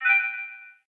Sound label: Touch Pop